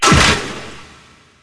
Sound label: death; alienarena; construct